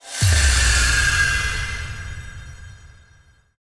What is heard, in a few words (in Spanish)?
efecto psiquico